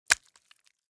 Fish slapping on the ground

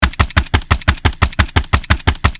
Slow firing machine gun short burst

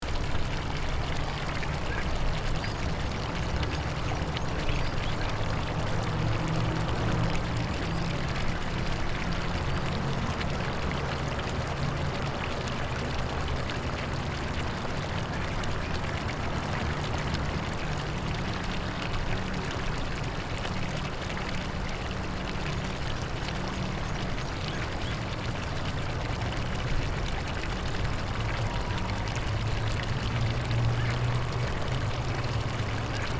Outdoor walla of park fountain water running

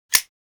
Zippo lighter close

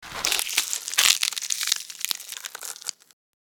Light skin cut and tear; gore

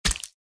Hitting bloody body

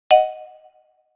Game success

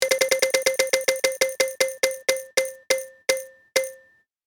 Fortune wheel spinning; leisure

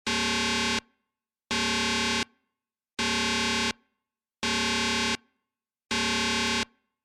Sci-fi alarm strong dramatic loopable

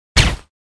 Pistol single shot suppressed

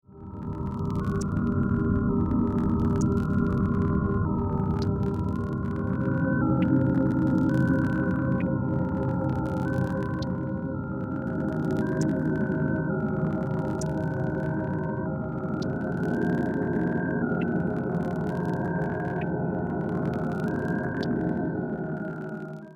Sci-fi soundscape loopable alien server room

